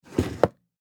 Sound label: Household Jewelry Box Drawer Close; Miscellaneous Household